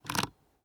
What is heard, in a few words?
camera handling